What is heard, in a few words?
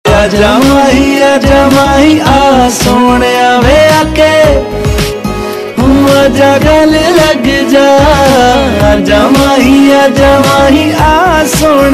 Unplugged, Dual Ringtone, Bollywood; Singh Is Bliing